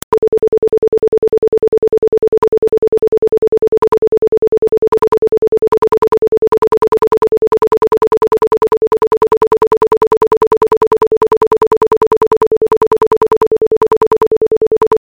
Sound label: pulse silence; arecibo